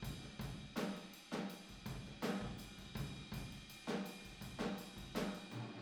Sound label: Boogaloo Chorus Room ST, 82 BPM